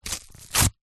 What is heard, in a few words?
cardboard piece rip; zapsplat